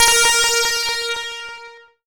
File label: A#, Synclav FM; Big Fish Audio; SPACE1